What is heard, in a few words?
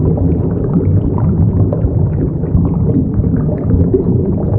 unreal under; baseq2; fadersounds